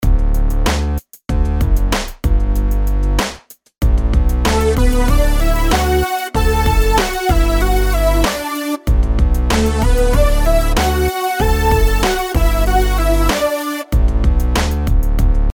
melodies simple Dmaj; melody

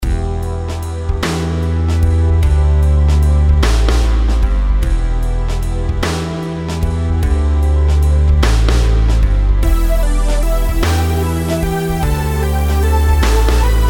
rhythms compound 8s

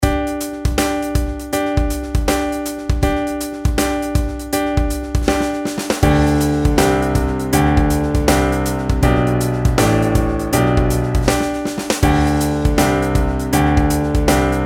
pitch patterns stepwise; melody

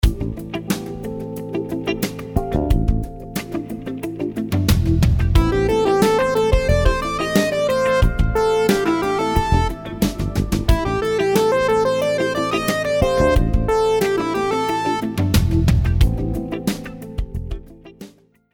rhythms 16s syncopated

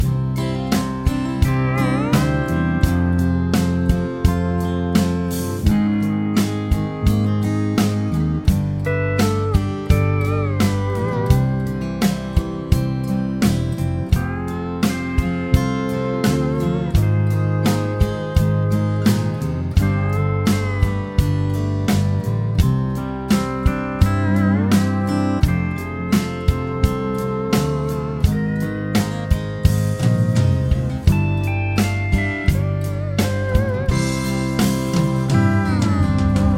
chord prog with mixture

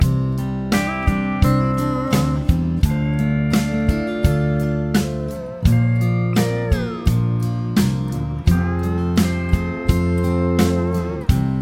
chord prog with mixture

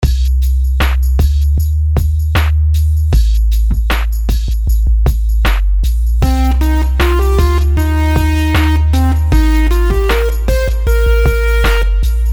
melodies simple 16s Cmin; melody

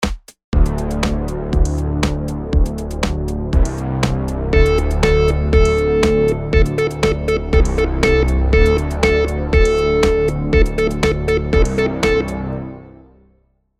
rhythm simple, monotone

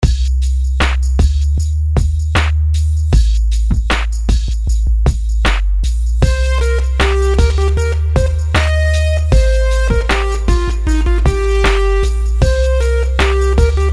rhythms simple 16ths

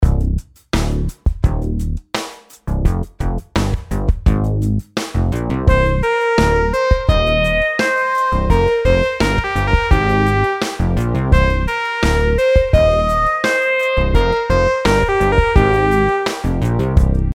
rhythm simple 8s triplets

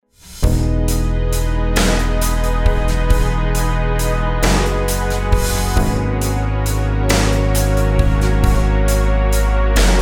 rhythms compound 8s w16s

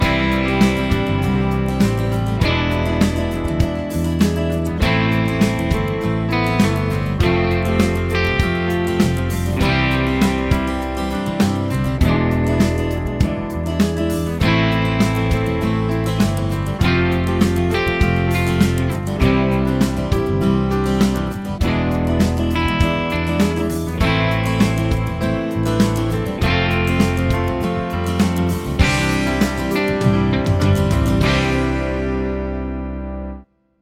progs with applied chords

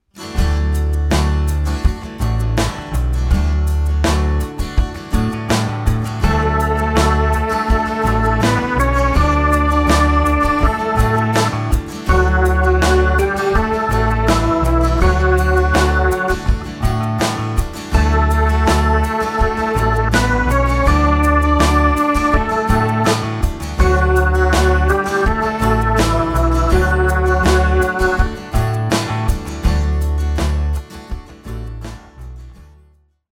melodic dictation

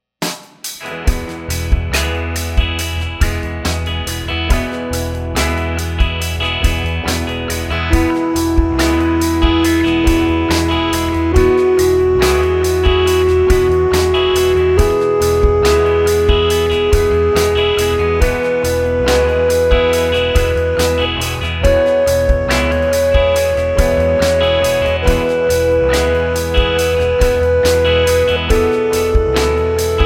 scale degree

